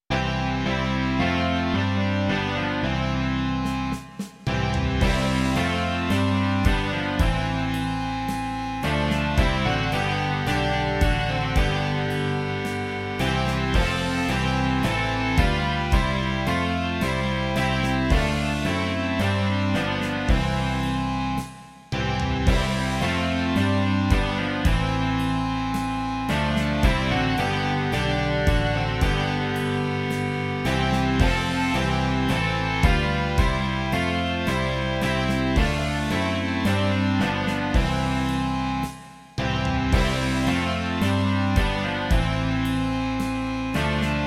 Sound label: rock